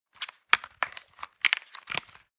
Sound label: Headphone adapter handling and movement; headphones and speakers